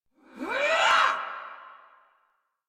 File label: Attack Effort; Warframe; Dax; Ranged